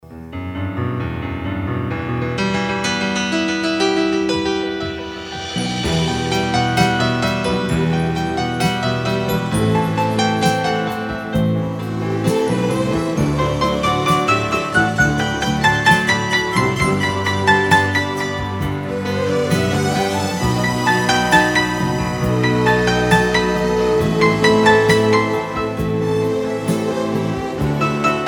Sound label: piano solo